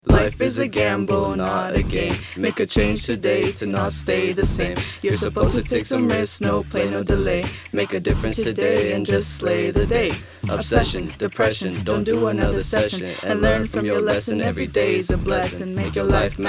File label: PSA Gambling RAP; Hamilton